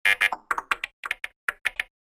Error Strange Key Press Sounds; High Tech Sound Effects

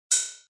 Metal Fizzle; Metallic; Electronic

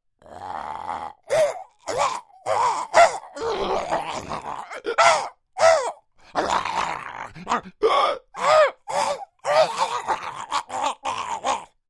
Creature Troll Yelps Pain Distress, Monster; Horror

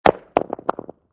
Rock Large Drop On Cement

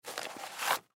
Newspaper Pick Up Cement; Paper (Sound Effects:Materials); Foley; Blastwave

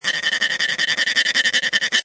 Cartoon Sawing, quick rattling of saw; Comedy/Cartoon; Funny Sounds

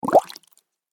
Water Bubble Surface Single; Nature

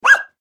Dog Wheaten Terrier Bark; Animal; Dogs; Blastwave

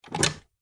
Door Open Credenza, Door Open Credenza Latch; Miscellaneous Doors; Household; Blastwave

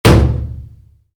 Wood hit metal heavy, Wood, Impact: Metal, Heavy; Materials; Stuart Duffield

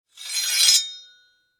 Warfare Sword Scrape Blade Metal; Sword Sound Effects; Swords (Sound Effects:Combat); SFX Bible 6

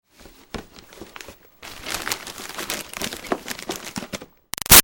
Foley Box Xmas Present Moves Crinkle; Crinkling; Objects

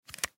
Cell Open, Cell Telephone Open Extend; Cell Phones; Electronics; Technology; Blastwave

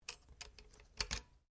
RIFLE ANSCHUTZ 67MSR 22 CALIBRE MAGAZINE RELEASE; A selection of domesttic firearms; Rifles

